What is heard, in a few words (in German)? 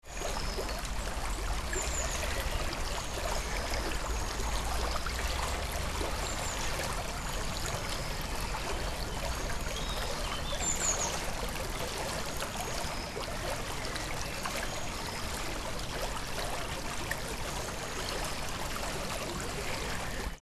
bach plaetschern; barfuss durch den bach